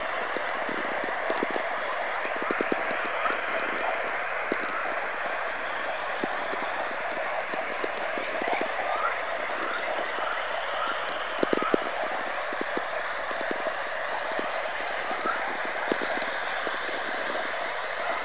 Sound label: VLF dawn chorus hiss; mcgreevy